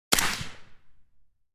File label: HK416 single shot